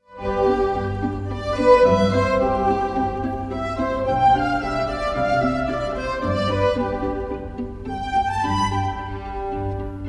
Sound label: string ensemble, quartet for strings; roman hoffstetter; robert salter; guildhall string ensemble; op 3 5 in f major